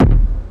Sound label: fresh nice kick one shot d sharp key; kick drum